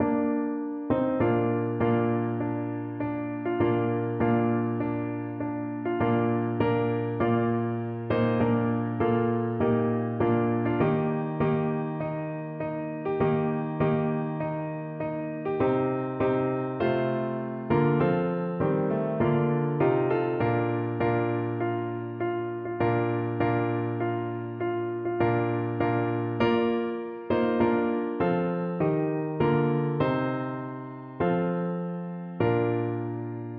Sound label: winter over, piano